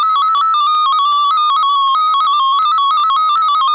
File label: mfsk2